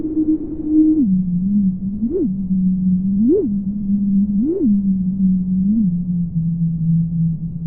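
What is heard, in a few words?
pink, Sound sonification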